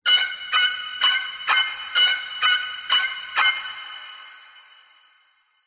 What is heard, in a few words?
horror strings stabs psycho